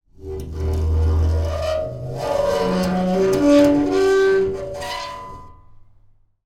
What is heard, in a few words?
Pane Of Glass Rub